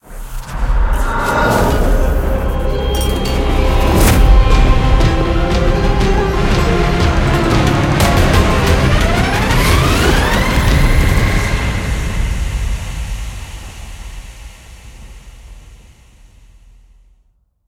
free spin Triggered; mercy of the gods

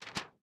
reel bounce; shangrila

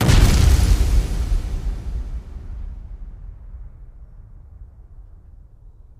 big win txt change; eldorado

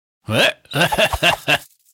panda Large Win; shangrila

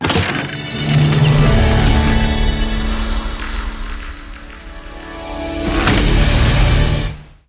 pick And Click Coin; fairyred

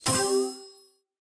panther collected; serengetikings

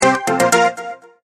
bet sound; jackpot 6000

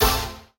scatter short; spinsane

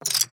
chip Close; mega joker

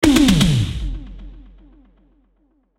Toms; knight rider

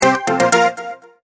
bet sound; jackpot 6000

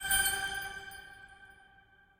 hide Gem; wild bazaar

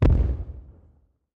firework Explosion; shangrila

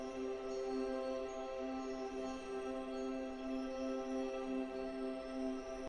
main Game Chord C; fairymirror

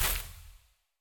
win Symbol Flash; fairyhansel

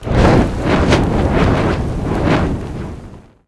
Win Fire Burst; aloha

